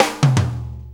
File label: Shuffle Fill, Drum Tools